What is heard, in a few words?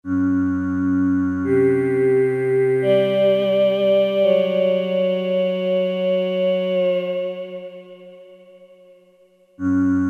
PPG VOCALISE; Akai SG01v